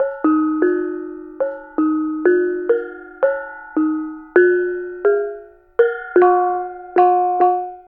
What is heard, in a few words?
BONANG; Spectrasonics; Heart of Asia; BONANG PH